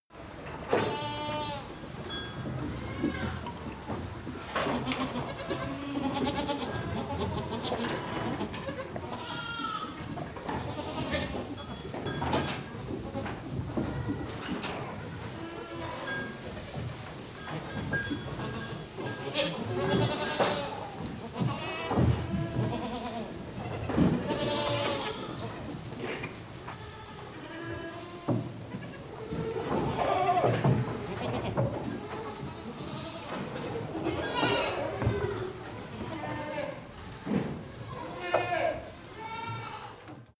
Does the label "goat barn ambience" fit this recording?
Yes